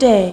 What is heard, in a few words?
Vocal Oneshots; Vengeance Samples; Vocals